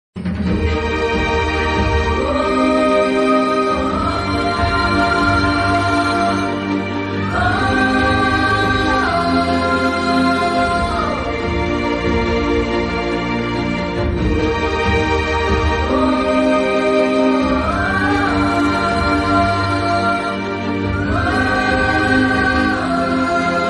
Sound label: climax bgm, telugu; ashoka vanamlo arjuna kalyanam